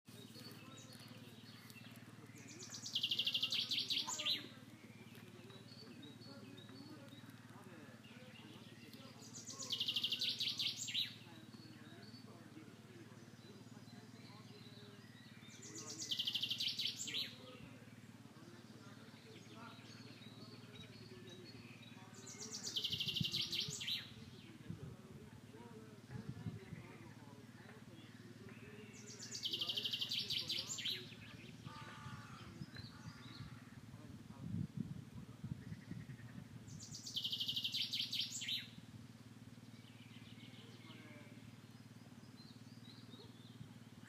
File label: Bird Voice in Abas Abade Garden of Behshahr